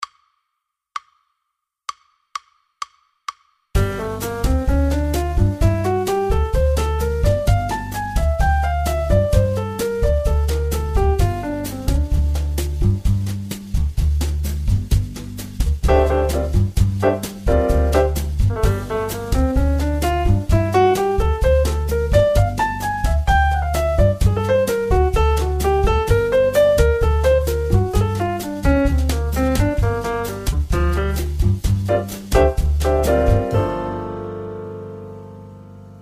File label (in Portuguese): Exemplo Lick Gm Bossa Nova